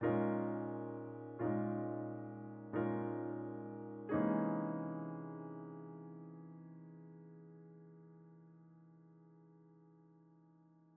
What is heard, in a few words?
Piano